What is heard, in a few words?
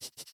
rabbit; mobs; mobs mc